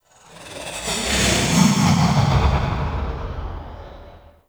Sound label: Exterior Passby; Ambience; Warframe; Corpus Railjack